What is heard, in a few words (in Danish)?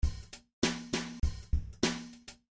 Tromme Loop 100bpm